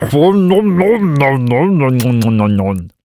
Vampire Attack Vocals

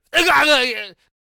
Peasant Death Vocals

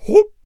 Bard Attack Vocals

